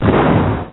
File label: grenade